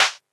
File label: Clap, retro house samples, Drum Hits